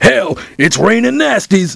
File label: LAUGH; BattleSNDS